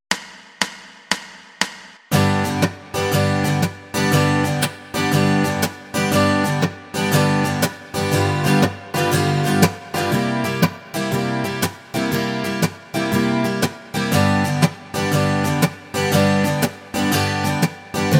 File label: Riffs G